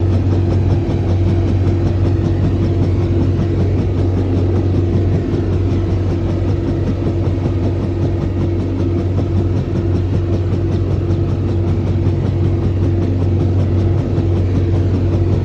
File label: Fan, fans big; Fan Martain R6